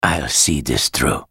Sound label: attack; BlindMonk